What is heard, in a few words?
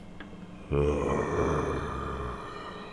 zom ambient